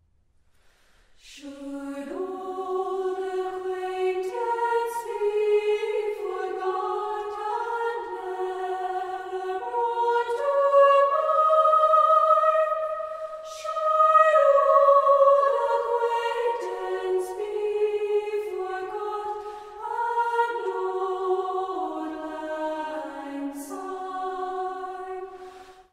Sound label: cadences